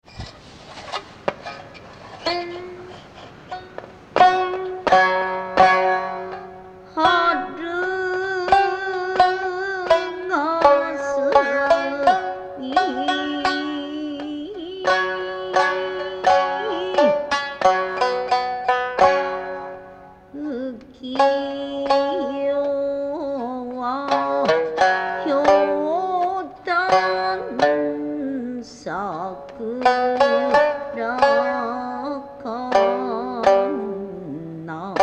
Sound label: Shamisen